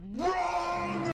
Wrong

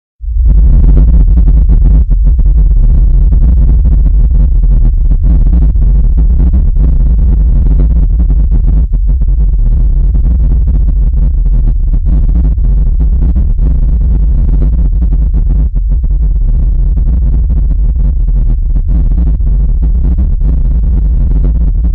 Earthquake Effects

